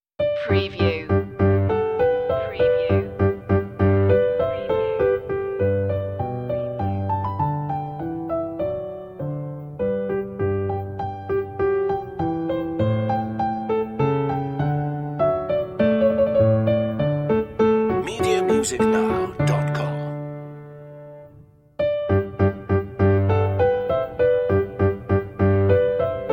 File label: bach marche g major; notebooks annamagdalena bach; bwv anh124